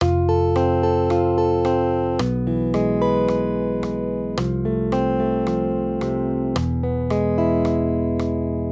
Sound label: maj triads; accompaniment